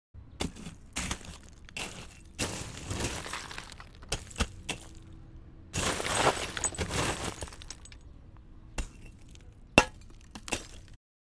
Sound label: Digging In Dirt